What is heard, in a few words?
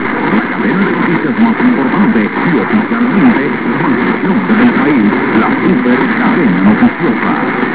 radio